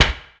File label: backfire damaged, vehicles